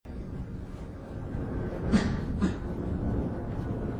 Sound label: cough 2x clipped